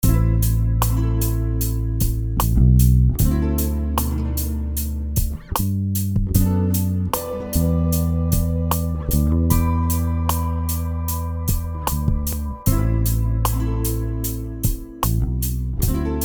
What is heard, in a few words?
with bass; time apparitions